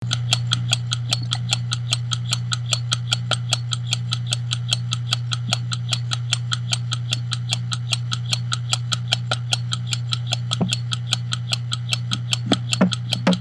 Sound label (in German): zylinderhemmung